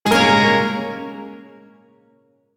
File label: Shawzin Yo Chord; DE; Warframe; Duviri Shawzin